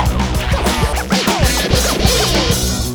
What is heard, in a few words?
Snare Fill With Edit